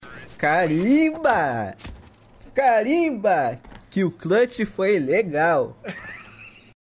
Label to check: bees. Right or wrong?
Wrong